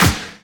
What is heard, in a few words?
Snares; Vengeance - Essential Clubsounds Vol.4